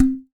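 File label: KAE KEEKE, HAWAII PERC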